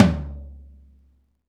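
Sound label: tom low, Roland; Club-50 - Foundations Roland; Ext.Snare 2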